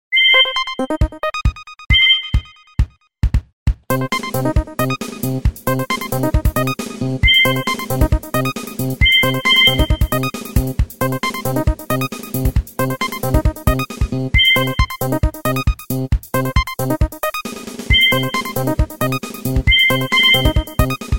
disco ringtone, disco ringtones

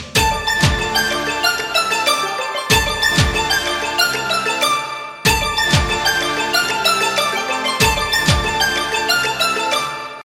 keyboard

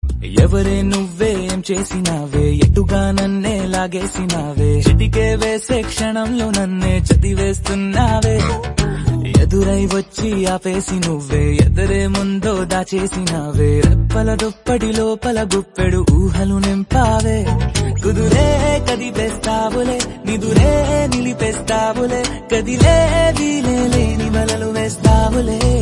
Tamil

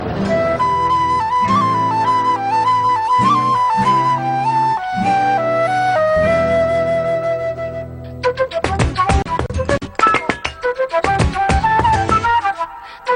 flute sound effects

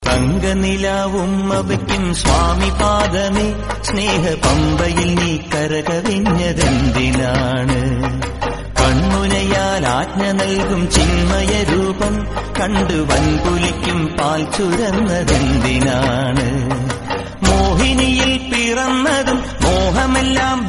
Ayyappa Devotional, ringtone

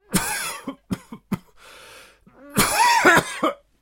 coughing sound effect

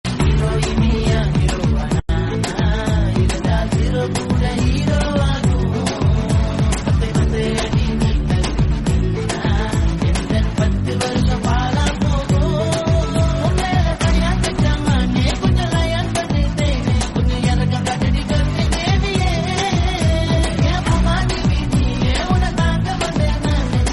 Tamil Album Song

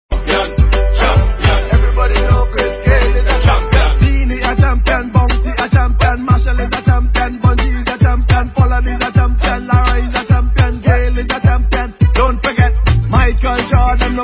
remix ringtone

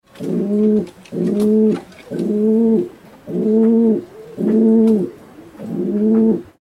pigeons sound

